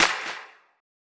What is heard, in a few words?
Clap Cracker; One Shots; Drums; Ableton Live 12 Lite; Core Library